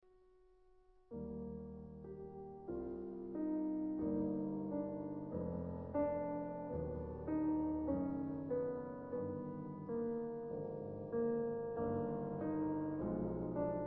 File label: Chorale